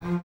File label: Basses F 3, f Legato; String Ensembles; Miroslav Vitous; 90 s Sample CDs